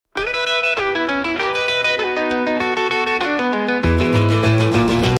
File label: Boogie Man Guitar